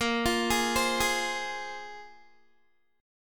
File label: A sharp 7th Suspended 2nd, Guitar; A#7sus2; arpeggiated; 17,20,20,17,20,x